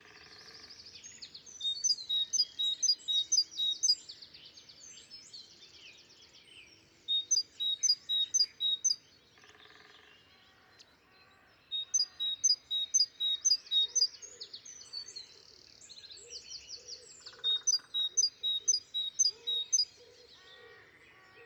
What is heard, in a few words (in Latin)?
Parus major; SETE